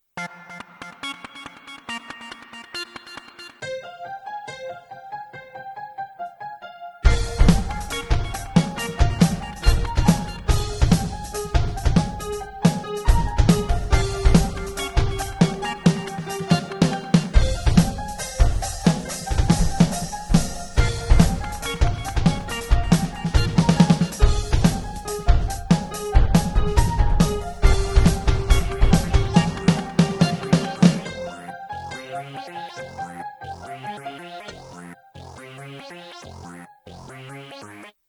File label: Drumit loopkit